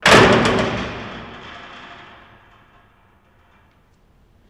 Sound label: Jail Door Close Ratt; jail & prison doors